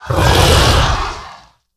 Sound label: Oondasta Attack